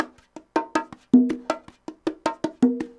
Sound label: bongos 80 bpm, latin